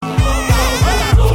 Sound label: wave